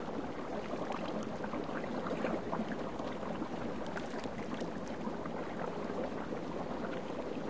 jacuzzi; Secret Agent

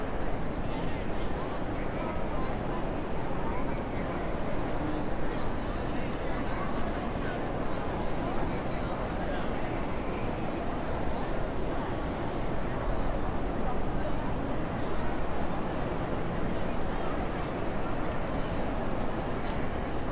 crowd station loop; Film Noir SoundPad

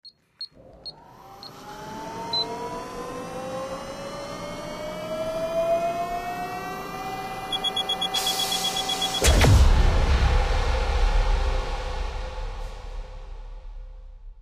impressive tech; Secret Agent

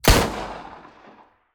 pistol shot; Film Noir; SoundPad

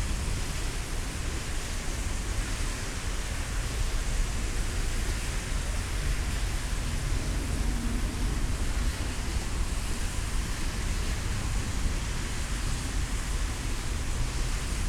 traffic wet loop; Film Noir; SoundPad

